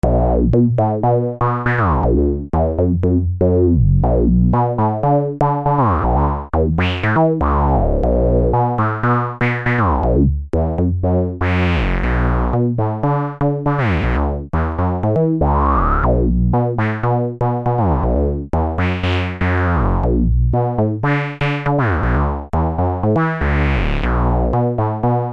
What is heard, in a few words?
2 Osc Bass